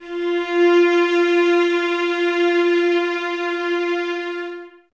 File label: violas F 4, String Ensembles; Miroslav Vitous; VLS 1+2